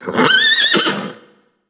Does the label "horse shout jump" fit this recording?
Yes